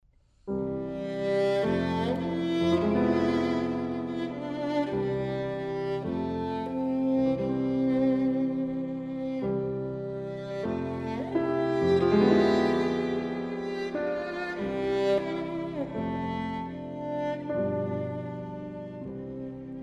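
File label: violin sonata; godard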